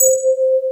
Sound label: Electric Pianos; Wizoo Electric Pianos